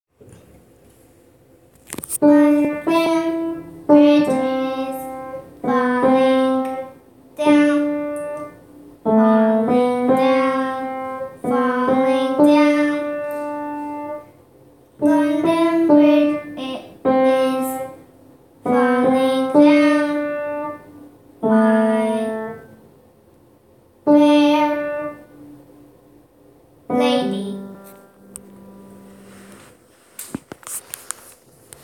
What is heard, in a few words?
London Bridge is Falling Down Played on Upright Piano